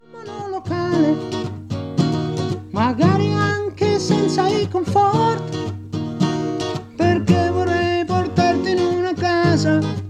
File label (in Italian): provino